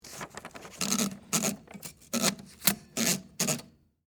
Typewriter Load Paper, Technology, Typewriter, Load, Paper; Typing; Offices; BlastWave